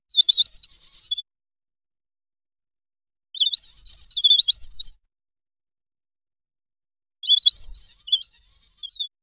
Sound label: short light, 2d